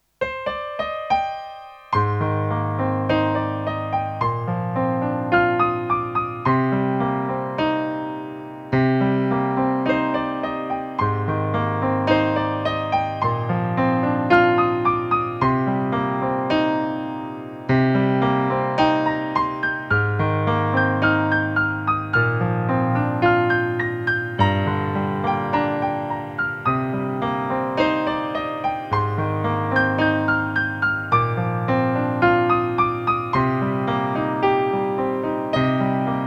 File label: night piano